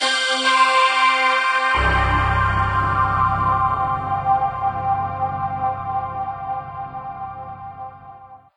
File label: music; ScreenCompany